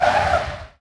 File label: squeak end; War Thunder